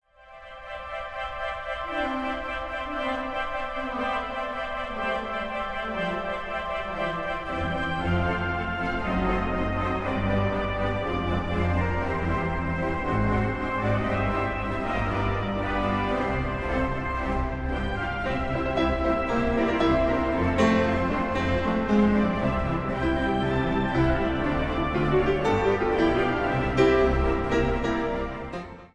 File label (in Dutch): Opwekking energiek